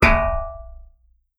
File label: hitsound; GoakeFlax